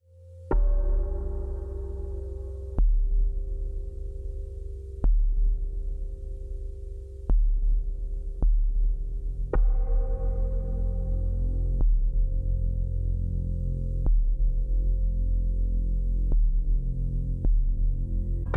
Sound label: Powerful Theta Brainwaves Zen Meditation Music